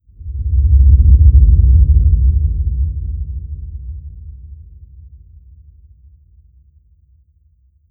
Stinger Low, Cinematic Horror; Sir Bedlam Productions; Bedlam Stingers; Cinematic Horror Sound FX

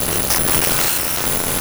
Rhythm

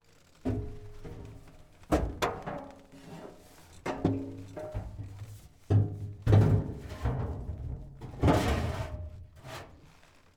Kubelwagen Replacing Gas Can Interior; Volkswagen Kübelwagen 1943; Pole Position

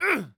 Grunts, British Soldier Voices; 344 Audio

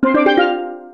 jingles STEEL; Kenney's Sound Pack; Music Jingles

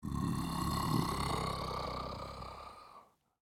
Test Subject, Zombie; Zombie Specimens Vol 2

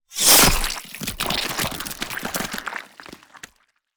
GORE - Disembowel Gore; SoundMorph

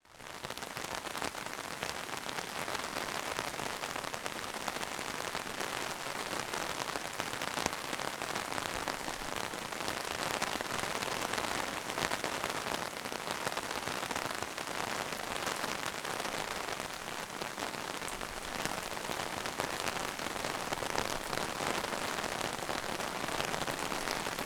rain umbrella wide; 3maze; European Ambiances

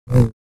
Saber; FXHome

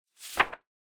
a4 sheet page sliding, Paper; SpillAudio

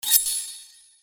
Sword Sound; FXHome; Fighting Sounds

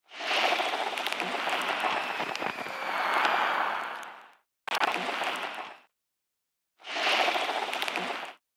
Textures; 99Sounds; The Weird Side Samples

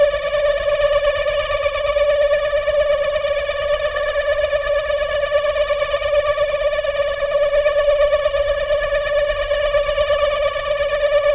ATMOS, Cinematic Sound Effects; 99Sounds; Generdyn

